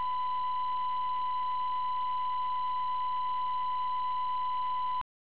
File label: pure, 純音 1000Hz -20dB; 人工音